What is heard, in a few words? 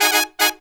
Horns, 110BPM